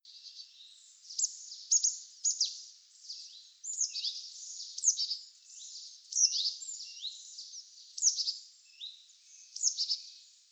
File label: Poecile palustris; SETE